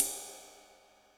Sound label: Deep Cymbal, Yamaha DTXpress